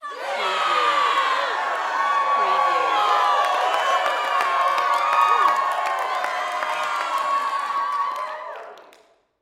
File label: Sports Crowd Cheer Touchdown High School Sports